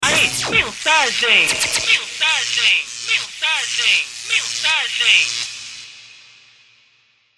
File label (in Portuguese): toque para celular ai mensagem